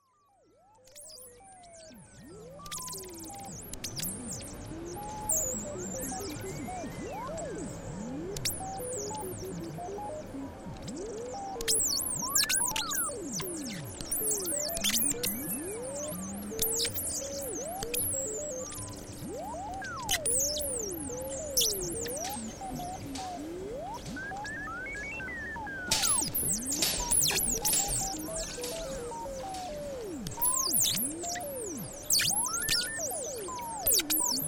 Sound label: washing machine